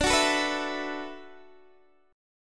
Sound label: D 6th Add 9th D, Guitar, Open D